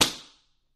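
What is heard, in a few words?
Book Slam Slap Squeak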